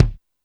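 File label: kick 60's R&B, Alesis D4 drum machine; 300 Drum Machines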